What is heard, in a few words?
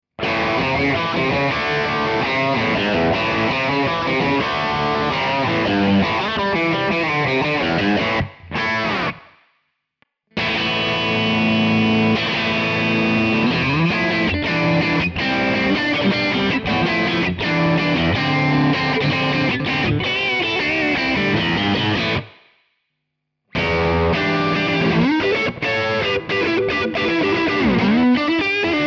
guitar Tele